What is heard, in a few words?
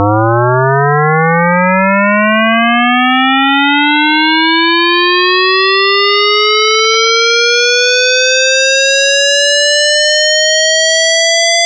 hp gliss